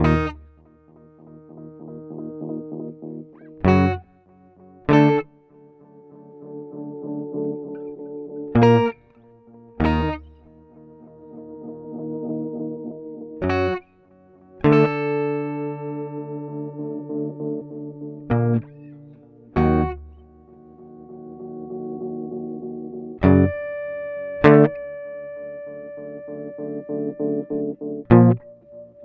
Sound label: Amplitube X-Time Duck